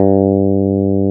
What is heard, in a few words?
SMOOTHBS G3, SMOOTH BS; Drums In Your FACE!